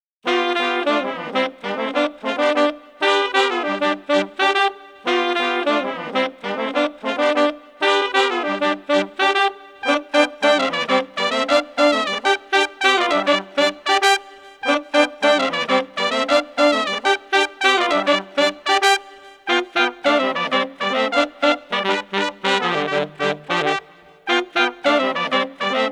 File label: Street Brass Combo Peppy 100